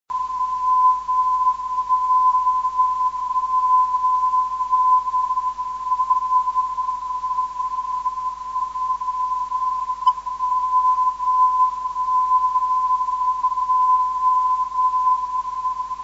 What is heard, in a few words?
401khz